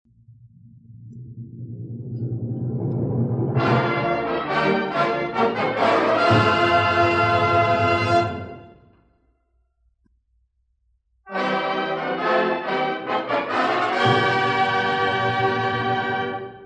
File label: Fanfare